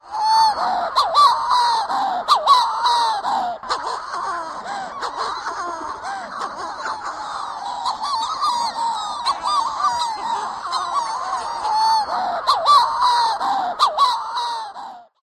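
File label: Manx Shearwater, colony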